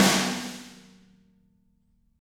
Snare Crack

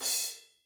Crash Choke, cymbal; apulSoft apTrigga2; Multi-Velocity Cymbal Samples

